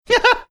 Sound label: funny chuckle